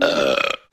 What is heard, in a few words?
burp short